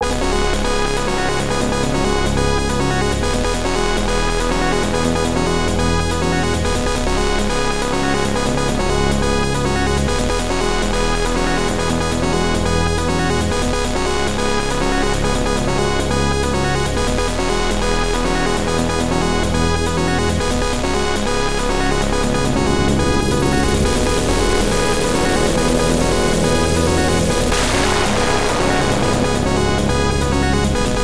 Warp Out; Star Cruiser; X68000

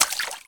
Footstep water light

